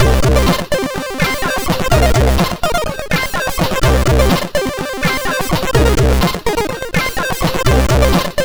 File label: Fade In Intro, MOS6581R2